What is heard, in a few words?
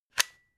plug unplug single